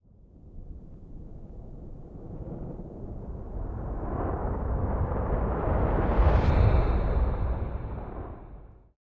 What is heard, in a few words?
rewind end